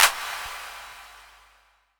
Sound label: Clap; FLP Trap Beat + Instruments